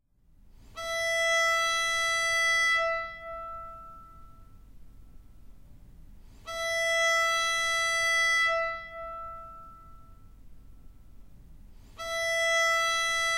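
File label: The Tuning Notes E A D G, violin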